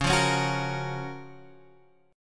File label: F sharp Major 7th, C sharp